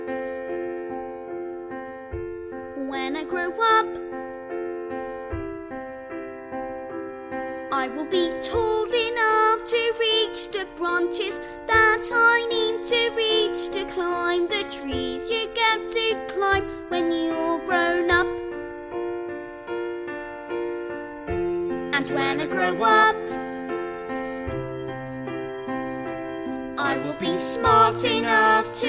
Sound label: vocals